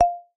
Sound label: hover